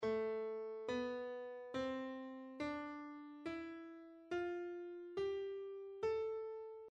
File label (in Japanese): Aマイナー 自然短音階